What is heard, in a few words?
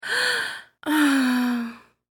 Human Female Sigh Inhale Exhale; Sighing (Sound Effects:Voice)